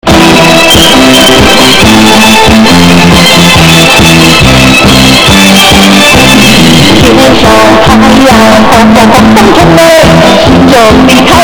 chinese song earrape

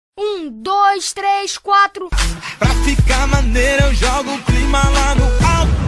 ba dum tss

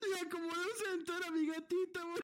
gato heart

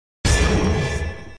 injure sound; rogue lineage; injury

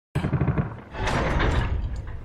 metal cranking

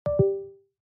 windows 11 usb disconnect

